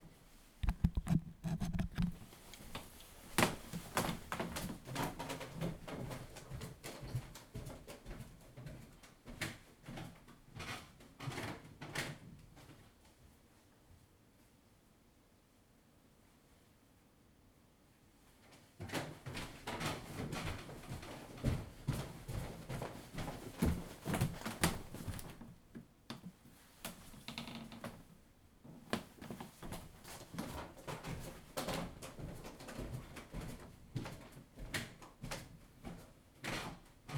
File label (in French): Escalier en bois; ZoomH2N; Maison